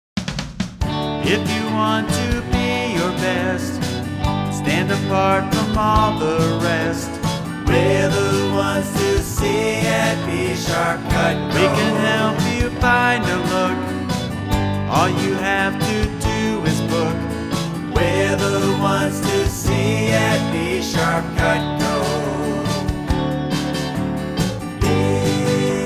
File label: Jingle